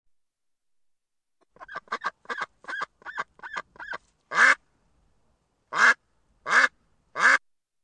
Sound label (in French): canard domestique; oiseaux